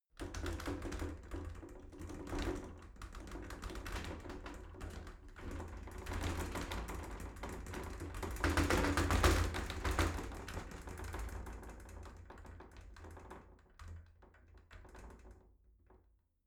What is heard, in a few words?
shower slide door rattle long